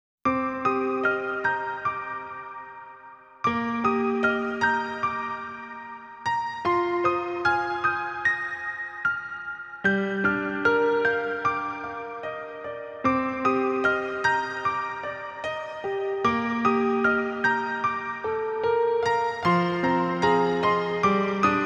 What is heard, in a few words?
Piano; Separate Hearts Original Audio Collection; Fate -Pathetic poem